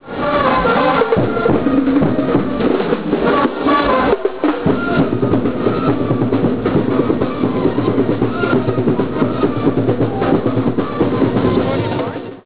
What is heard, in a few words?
march band